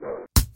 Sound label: ice cream throw